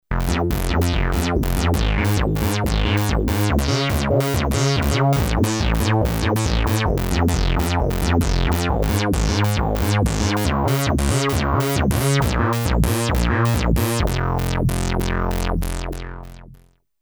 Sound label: bassline, Polivoks; not really synched; Polivoks formanta